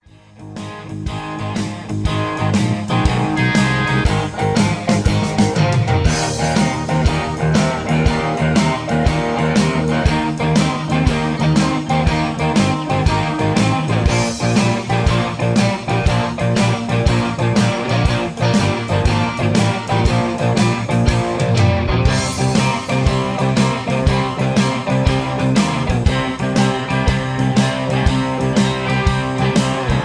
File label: I-V-VI-IV Texas Boogie Eb 120; Peach Jam Vol 1 Part 2 Texas Boogie